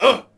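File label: Swordsman Hit Vox; Civ4 warlords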